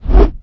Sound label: kiting swosch heavy